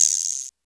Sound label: rattle; Roland R-70; Perc